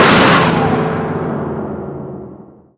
mortal strike target; weapons; dota; skeleton king

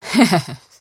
mirana laugh; dota

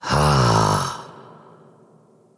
wolf attack emote; dota; crystalmaiden

